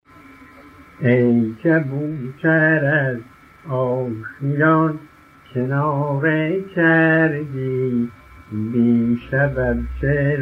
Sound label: tasnif kaboutar